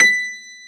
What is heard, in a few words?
PF B 4